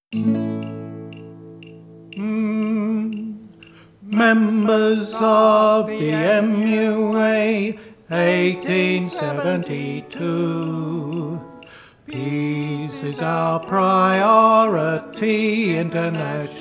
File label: bass sung